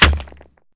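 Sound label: Bullet Light Ballistic Concrete impact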